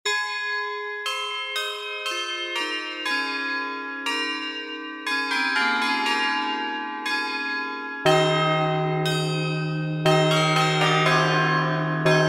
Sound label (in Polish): hejnal